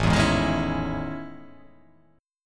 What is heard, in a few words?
A Major Sharp 11th